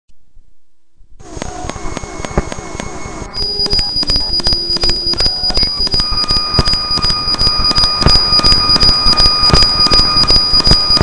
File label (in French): Bruits des extras